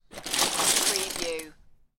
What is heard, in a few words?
Lego, rummaging in lego box